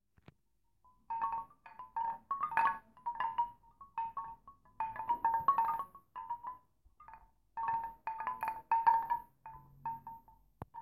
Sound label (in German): Windspiel